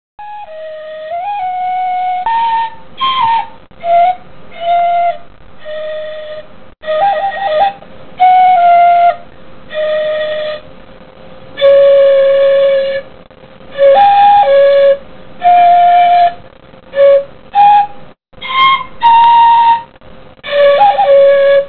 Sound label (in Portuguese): sopro